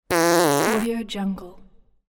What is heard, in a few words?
fart